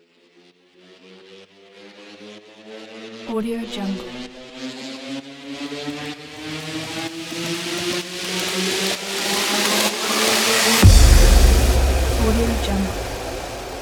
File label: rising uplifting build up transition